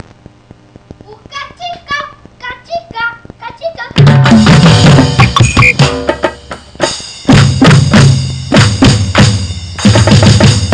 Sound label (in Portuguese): bateria e apito